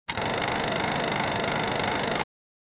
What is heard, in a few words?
sound of a slot machine, game ui